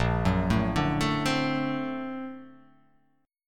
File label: Bb Augmented Major 9th Bb; Bb+M9 arpeggiated; JGuitar